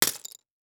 Impact Plastic Debris Hit By Wood Stick

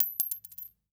Foley Coin Dime Dropping On Cement

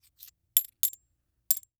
Coins Movement Counting Multiple; Foley

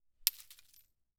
Weapon Bullet Shell 40 Drop On Leaves; AdobeCC

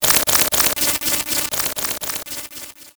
Title Transition Data Transmission Computer; Production Element Title Transition

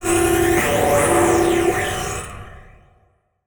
Title Transition Communication Distortion; Production Element Title Transition

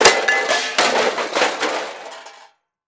Metal Objects Hitting Cement Multiple Hits; Crashes

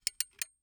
Industry Metal Clink Gear Slow

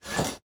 Shower Curtain Close, Household

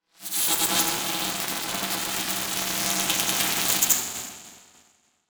Transition Electrical Distortion; Adobe; Production Element

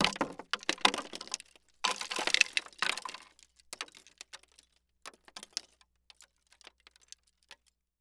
Crash Wood Debris On Wood Pallet Long; crashes; AdobeCC

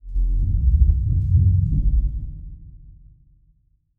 Production Element Title Transition Liquid

